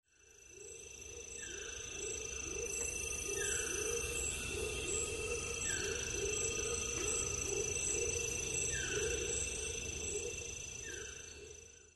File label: chorus